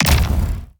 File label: thunder